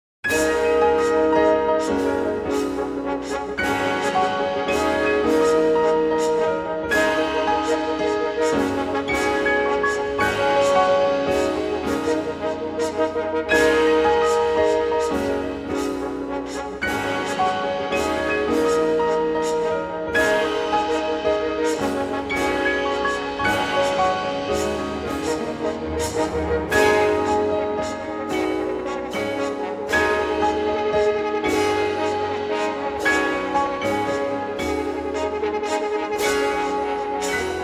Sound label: music for horns pianos and cymba; mcguire